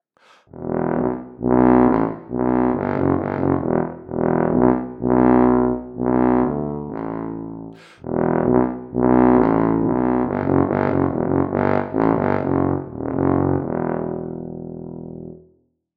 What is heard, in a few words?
Tuba